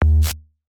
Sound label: Soul kick